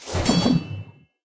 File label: Generic Combat